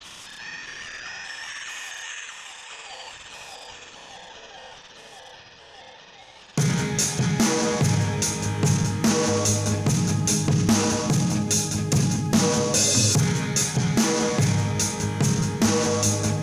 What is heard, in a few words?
industrial rock; Jamie Coleman, Film Composer